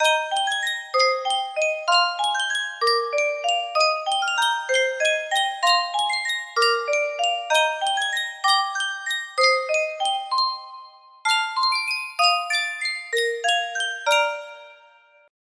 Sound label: sankyo music box, melody